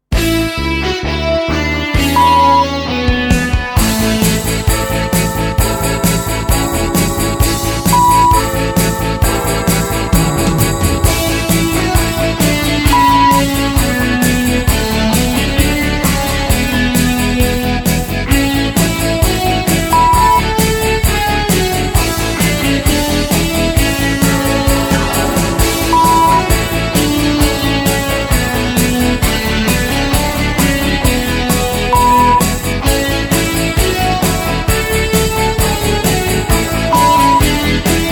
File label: XMas Hard Rock, 132